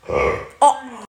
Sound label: roar